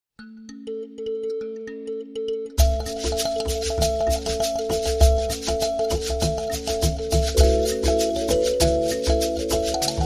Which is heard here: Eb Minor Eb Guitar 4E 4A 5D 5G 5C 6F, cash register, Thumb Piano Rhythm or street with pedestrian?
Thumb Piano Rhythm